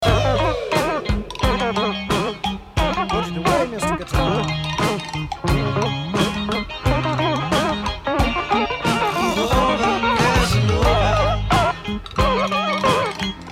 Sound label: guitar solo